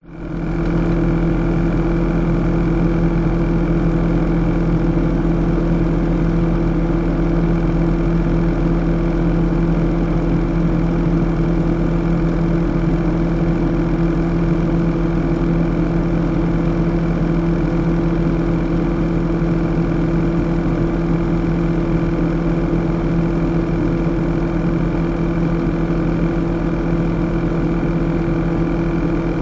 household freezer inside ambience operate westinghouse; zapsplat